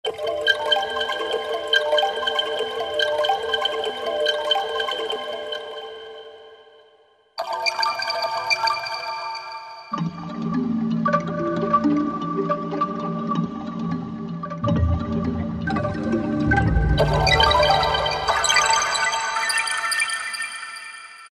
Korg M1; Factory preset examples

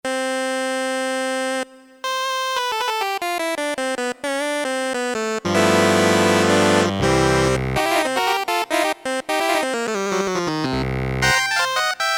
Drawn, Korg DSS-1, Created Waveforms

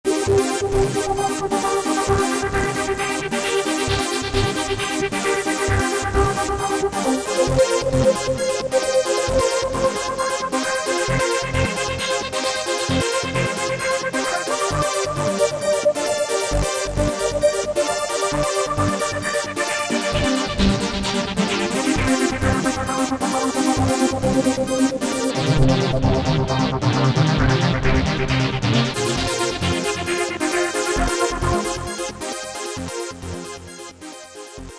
PsycheTrance, Korg MS2000; Factory Patches

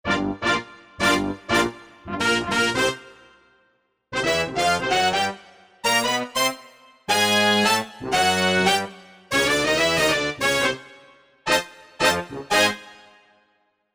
Jazz Brass, Korg MSC-9S Organ

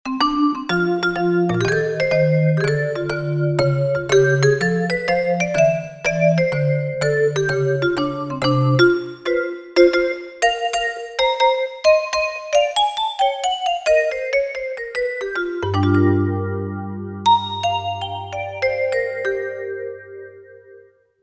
Vibe, Roland JV-880; Factory Patches

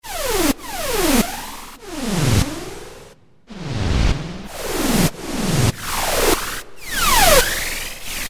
Tron Blast; Factory A

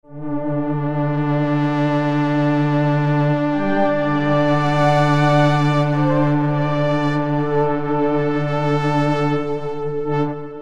Strings; Cheetah MS6

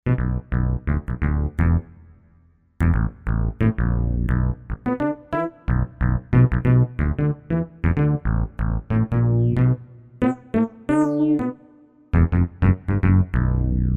FF Bass, Kawai K5000S

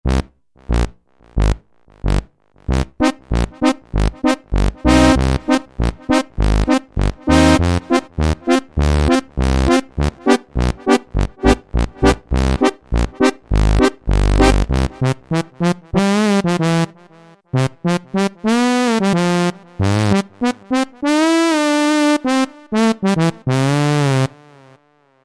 Brass; Cheetah MS6

